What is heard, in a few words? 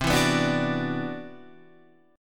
C-Major Sharp 11th, Guitar Drop D